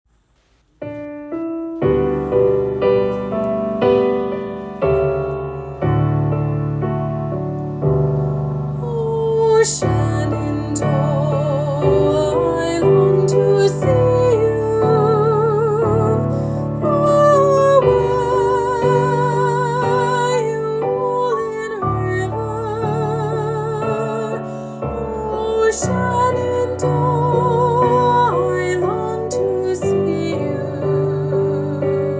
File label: Alto Example, chorus; Oh Shenandoah; all-state-chorus